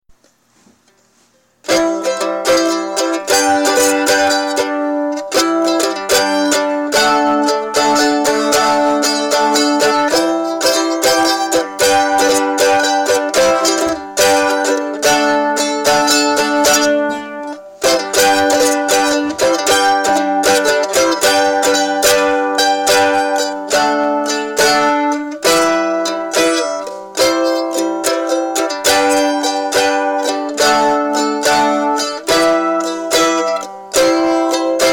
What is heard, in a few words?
messing around with the mandolin